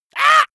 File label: monster assault high; schreie